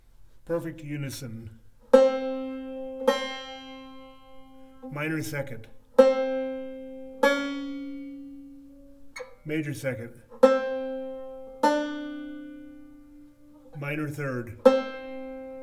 Simple Intervals; Beyond Chord Melody Volume II

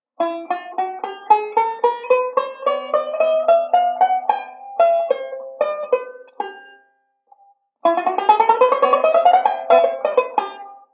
Chromatic Run, banjo; Beyond Chord Melody Volume II

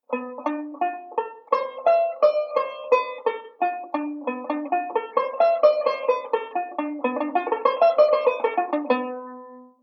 Modal Speed Drill, banjo; Beyond Chord Melody Volume II

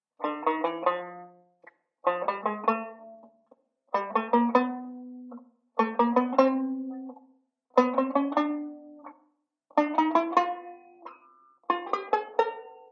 ii V 4th String Notes, banjo; Beyond Chord Melody Volume II

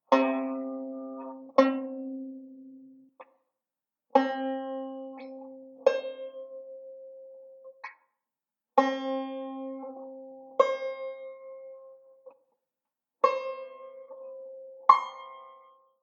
C Notes, banjo; Beyond Chord Melody Volume II

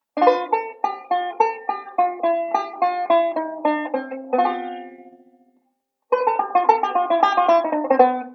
ii V Pattern, banjo; Beyond Chord Melody Volume II

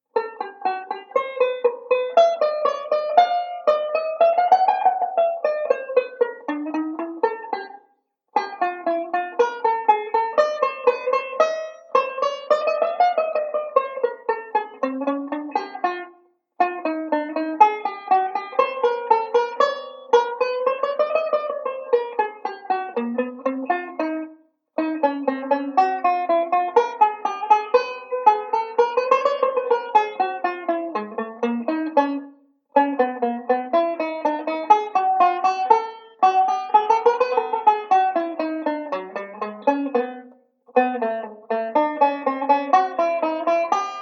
slow, chord melody; Beyond Chord Melody Volume II

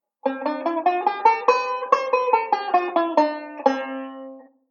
Delay Scale; Beyond Chord Melody Volume II

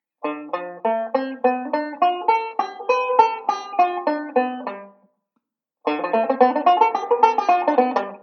ii V Pattern, banjo

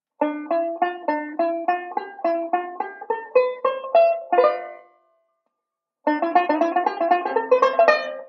ii V Pattern, banjo

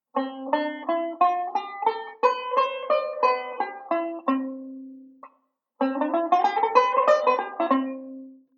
Modal Sequence; Beyond Chord Melody Volume II

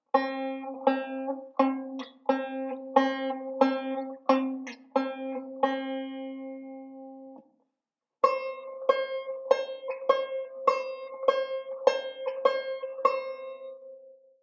C Note Drill, banjo; Beyond Chord Melody Volume II

